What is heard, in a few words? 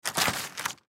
Paper Towel Dispenser; Manual Holder Pull Sheet Out Public Bathroom; INDUSTRY; Blastwave